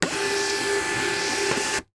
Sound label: office printer label dymo 4xl print or label feed